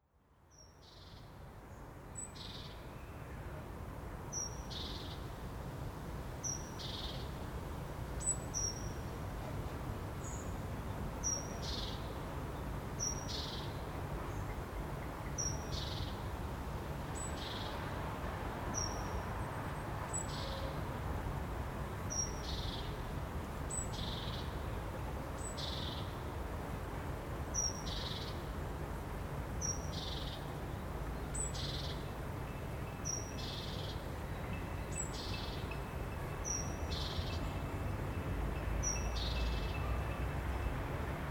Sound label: applause